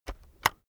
keyboard external enter press slow heavy

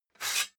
sword sabre sheathe slide

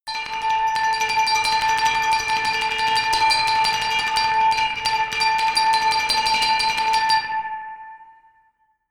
glockenspiel dark tremolo low octave

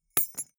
key ring small drop carpet

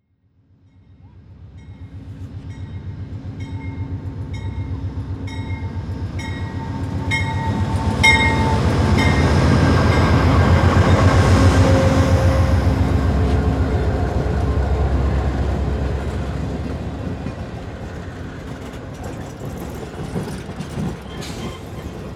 train long arriving

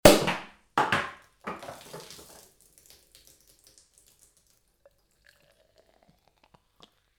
champagne bottle uncork

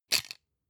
plastic frame small hit rattle

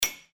hammering

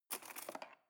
cutlery cake fork drawer grab

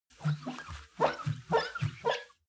clean window paper towel close short

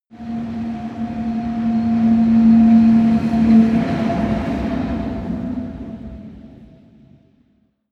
train locomotive drive by bump close humming

